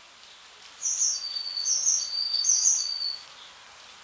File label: blue tit song, Very similar to Great tit's song, but one note is elongated.; Blue tit (Modraszka)